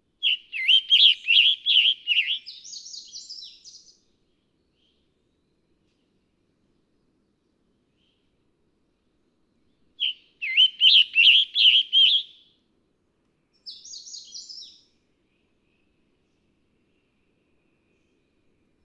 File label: Scarlet Tanager